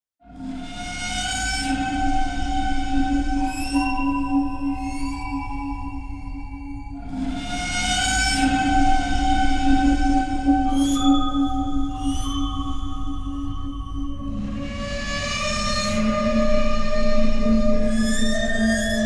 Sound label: Crying Bowls